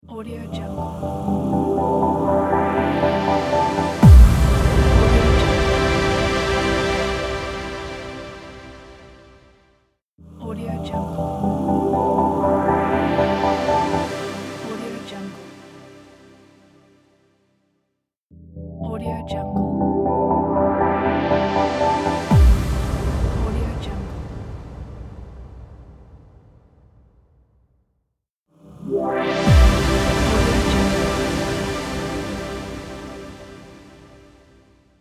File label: atmospheric opener